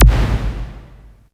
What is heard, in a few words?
ELECTR TOM, ELEC DRUM; USB Soundscan vol.20; Fresh Disco House I; AKAI